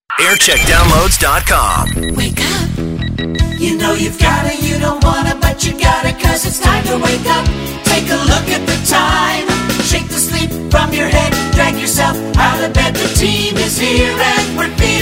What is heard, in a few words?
jingles; signal 2